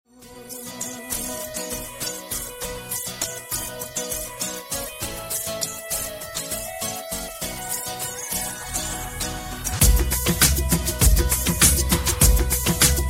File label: instrumental